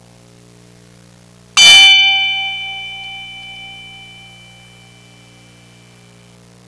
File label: swiss, sirens